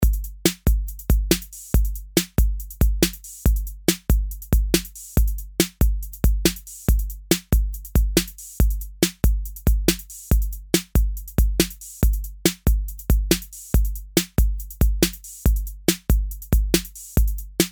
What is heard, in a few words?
808 Tube Preamp + Transformer